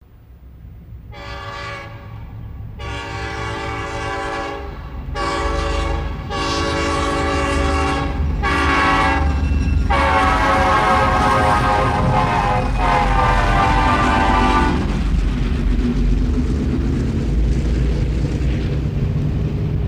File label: Train By Med Fst Horn, doppler horn bys